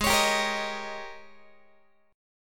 Ab Major 7th Suspended 2nd Suspended 4th Ab Guitar 4A,4E,5A,5D,5G sharp; AbM7sus2sus4; x,16,16,17,14,14; strummed; chordshape; JGuitar